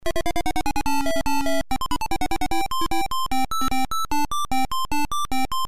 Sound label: Music Theme; Burgertime; DINER